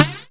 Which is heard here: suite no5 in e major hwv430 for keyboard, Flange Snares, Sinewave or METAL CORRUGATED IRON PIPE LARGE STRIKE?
Flange Snares